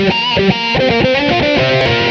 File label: guit lead; Sonic Foundry (Sony Creative Software); Ugly Remnants VOL-2